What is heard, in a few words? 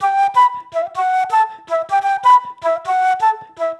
flute, Loops